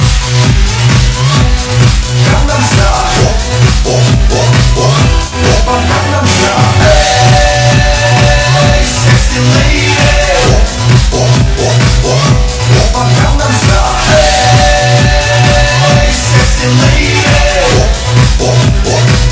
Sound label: bathroom